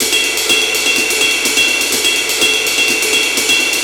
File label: 125 Classic Breaks, 125; Loops; Touch Loops; ClassicBreakSessions